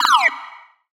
Sci-Fi Lasers - Droid Talk; Antidote Advent

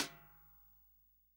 SWING SNARE CHROMATIC, Real Drum Kit; REAL DRUMS

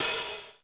R50E HHOP; Crypton - DrumBase5000; RZ1

